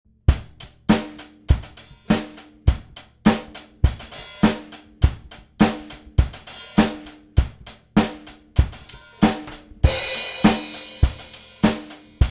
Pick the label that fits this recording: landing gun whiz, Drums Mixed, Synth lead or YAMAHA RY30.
Drums Mixed